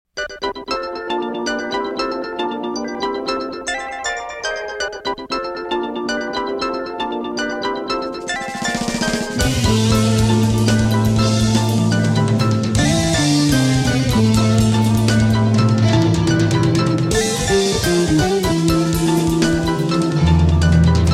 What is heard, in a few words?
(Instrumental)